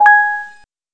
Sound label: explode; cuyo